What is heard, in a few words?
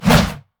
basic attack sword; rengar